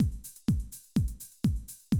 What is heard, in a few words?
125, Classic Drum Loops